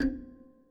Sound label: synth